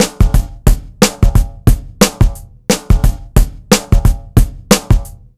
KG BEAT 2, Roots Funk; USB Soundscan vol.41; AKAI

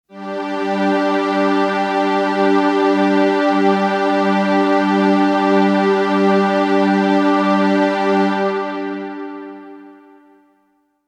Synth Pad G; Sonic Foundry (Sony Creative Software); Techno Synth Loops 1 Signals I

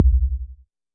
Voyager Tom, Moog Voyager

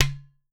UTAR, Definitive Percussion

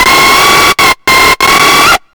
NUCLEAR, Hard & Loud Techno

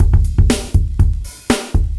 LOOP, 70's Breakbeats; USB Soundscan vol.46; AKAI

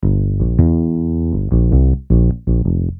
Bass, Synth Bass Loops

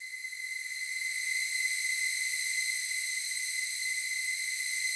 slow strings C6, Yamaha VP-1; 90 s Sample CDs; Music Store

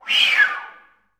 FL FX, Orchestra

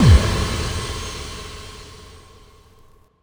Fall, Hits & Falls; Zero-G Trance Inducer

